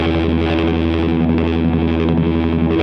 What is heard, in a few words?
Hondo Fuzz 170 E, guitar drone